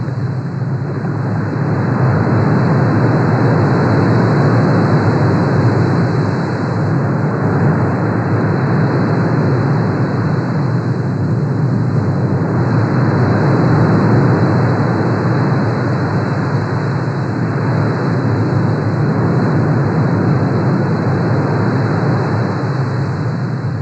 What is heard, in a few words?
Swell